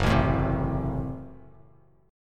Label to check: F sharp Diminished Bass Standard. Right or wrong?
Right